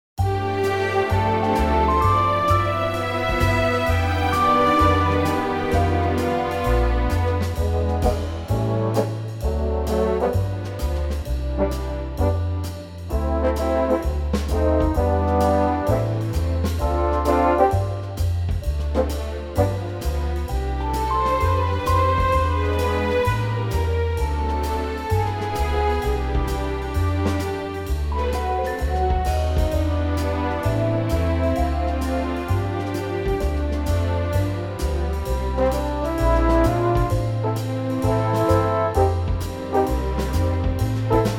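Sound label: C orchestral, backing track; key - C - vocal range - G to A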